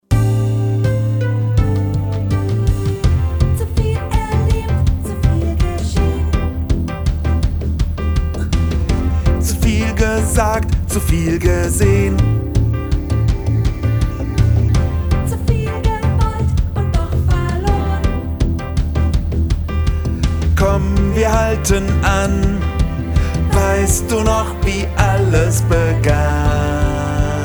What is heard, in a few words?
Tenor